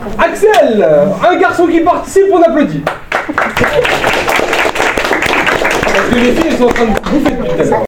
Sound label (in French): applaudissements